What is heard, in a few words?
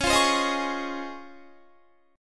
Db Major 9th, Guitar; strummed; JGuitar